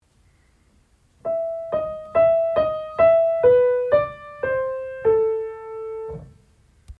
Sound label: legato